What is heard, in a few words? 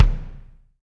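bass drum; Best Service Gigapack 2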